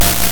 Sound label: Blank Glitch